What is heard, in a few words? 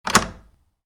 Latch, Metal: Slide Into Place; Latches; Objects; Stuart Duffield